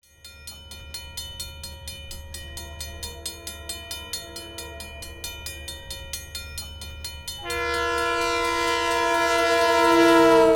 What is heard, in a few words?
Main Theme w Train, no VO; Real American Folk